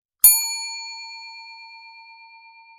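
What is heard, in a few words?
ring; zvon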